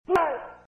ghostly meow

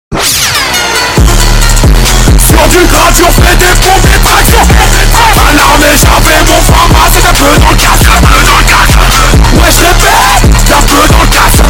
bass boosted

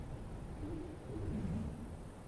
forge ambient

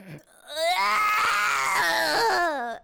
cringing

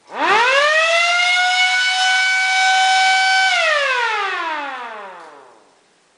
alarm

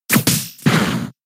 peck

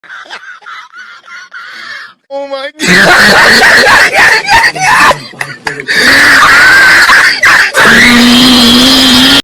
yes loud laughing

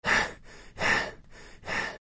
odyshka

